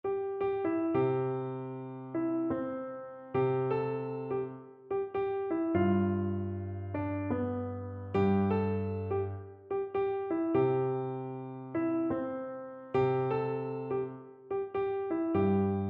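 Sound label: electric piano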